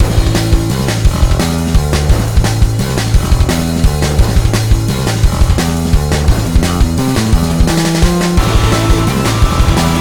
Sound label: bgm battle arctic ocean